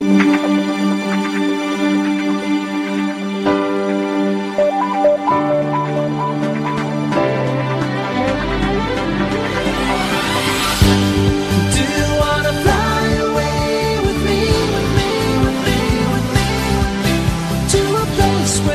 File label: ringtone